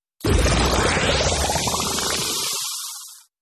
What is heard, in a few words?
Bubble Sound